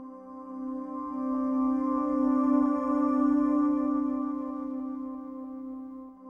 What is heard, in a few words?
Kelele, Kelele Drones; World Percussion